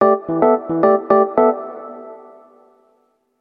sound logo

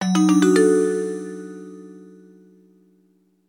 vib

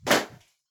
landing

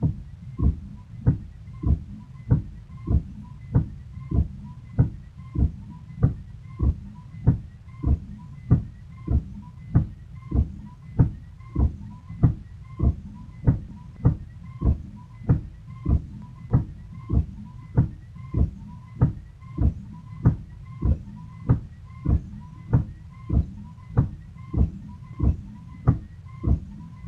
wiper

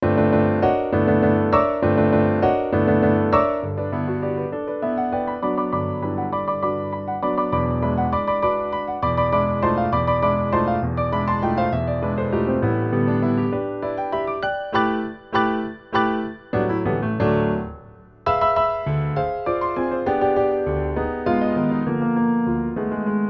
waltz; ravel

